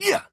Jump

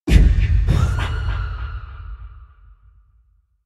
Kikikimamama Scream

